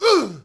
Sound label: Hit Vox; Civ4 warlords